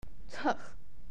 ugh

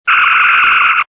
phaser

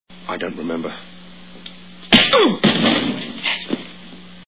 punch

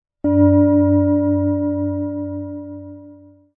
bronze bell bong

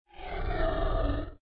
dragon growl

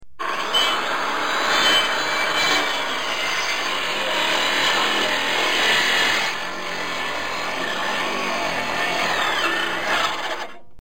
buzz saw

